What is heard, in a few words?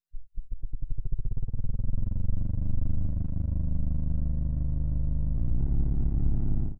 engine